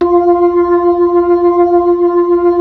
B-3

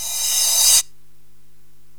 Cymbal reverse

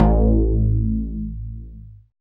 dance bass; Sound & Vision - Gigapack II CD 1

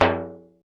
Tabl Noueri Rim, Percussion; Roland BK-5

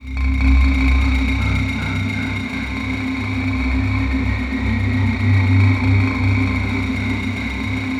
TUMBLING; ENVIRN-AMB

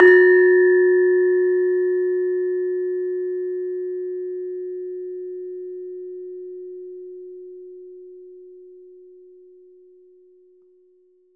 LAMEL F#3 -R; USB Soundscan vol.60; AKAI; LAMELOFO

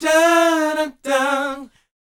BOYZ, Vocal Planet, R&B & Dance; ILIO

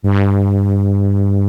CS-80 BRASS; East West - Global Sounds

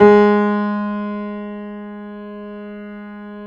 JAZZ G#2, Keyboards Acoustic; USB Soundscan vol.17

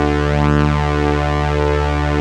OMNI STRIN, ARP Synths

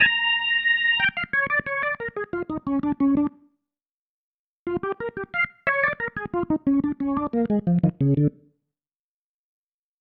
Pattern, Organ

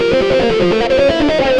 xtra lead

